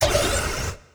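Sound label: construction progress, ui; Stellaris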